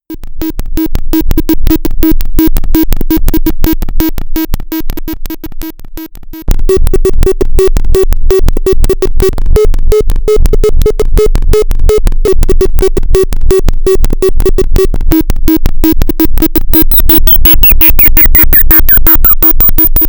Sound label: bass leads dancing lfo; dark energy